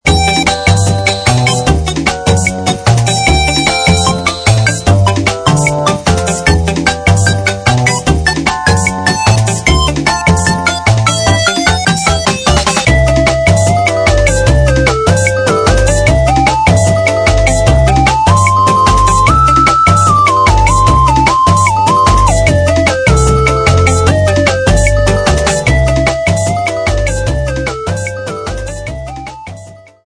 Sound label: robot, polymoog